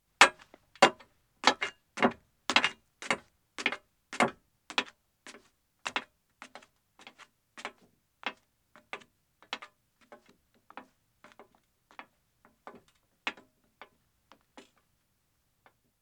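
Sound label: Metal Stairs Ascending, 1 Woman, footsteps; BBC Sound Effects Library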